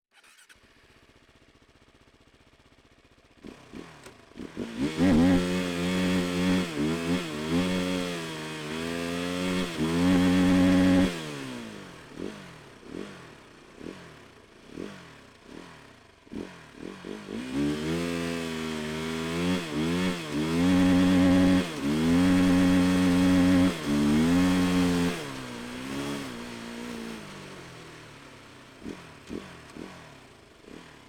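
Arctic Cat, SVX450, Onboard, Start, Fast, Drive, Stop, Off, Engine, Right, Snow Bike; Pole Position; PZM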